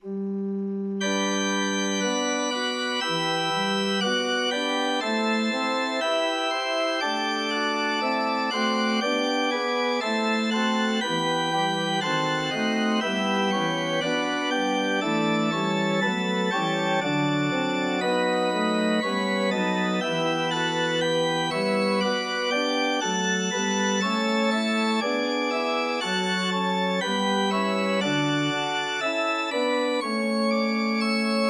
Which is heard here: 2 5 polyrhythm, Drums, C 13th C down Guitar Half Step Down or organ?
organ